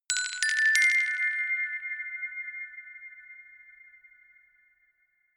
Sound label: notification glockenspiel rattle triad up diminished